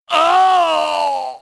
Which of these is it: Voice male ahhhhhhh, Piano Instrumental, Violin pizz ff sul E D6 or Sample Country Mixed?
Voice male ahhhhhhh